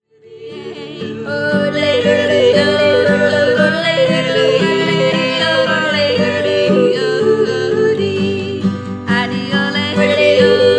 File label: Trio Harmony Yodel